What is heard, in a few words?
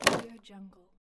dropping large bottled water on hard tiled surface; کاشی; افتادن بطری روی کاشی